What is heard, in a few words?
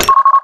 default locked, doors; Dystopia